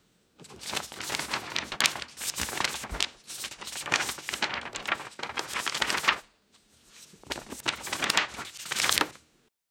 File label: opening paper book noisy; sound effect